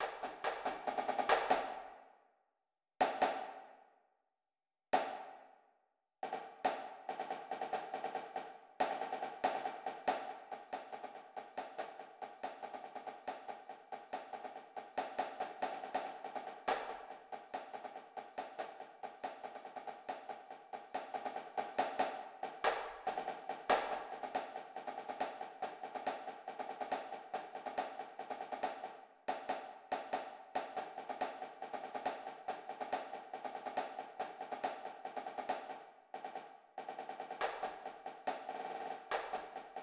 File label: snare, drumline